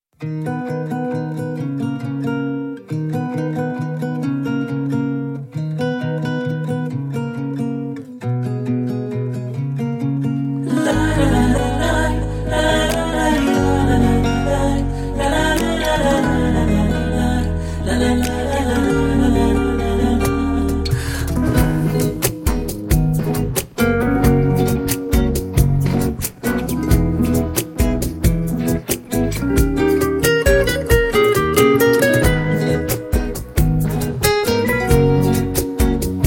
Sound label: Guitar Version